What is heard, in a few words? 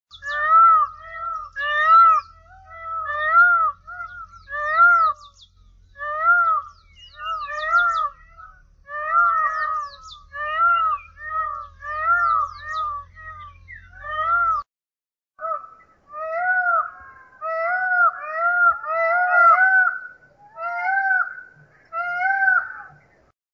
indian peafowl